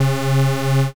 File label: wrong buzzer